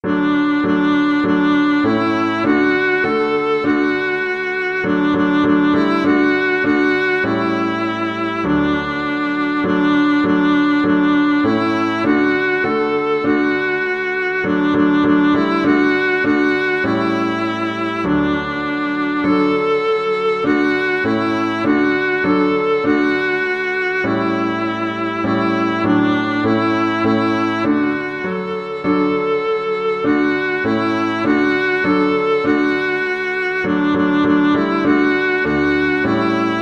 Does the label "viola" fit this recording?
Yes